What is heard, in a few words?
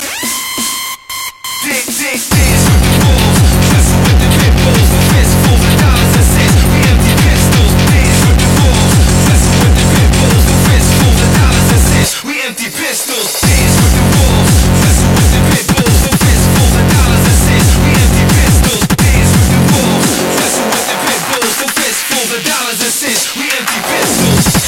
Emty Pistols